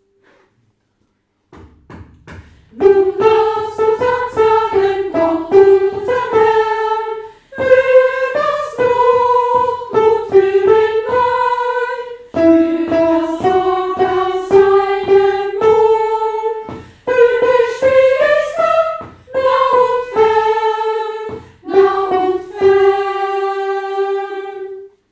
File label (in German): Dankhymnus Sopran